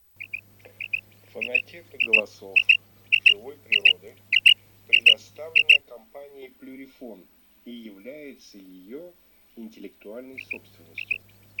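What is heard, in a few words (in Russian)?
Перепел Quaglia, chip mini для манка; plurifon; chip mini 20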